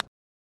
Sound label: Putter Shot